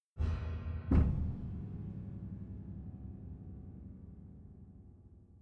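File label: Sustain release Pianoteq bosen 200; ptq6.6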